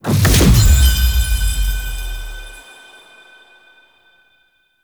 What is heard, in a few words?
Platform Activate; Warframe; Ambience; Cephalon Weave